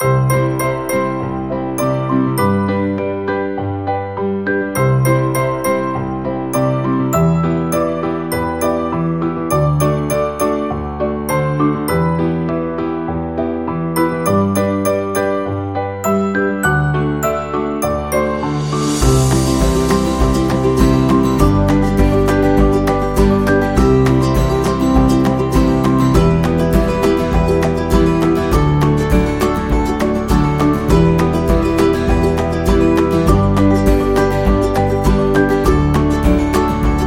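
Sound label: Happy Birthday Kids, Kids background music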